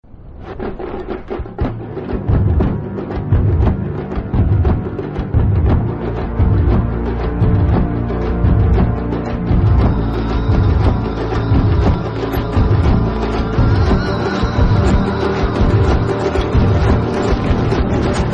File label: Tamil Bgm Ringtone; Mahaan Movie